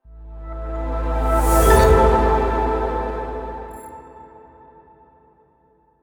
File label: Logo; raspberrymusic